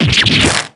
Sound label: damage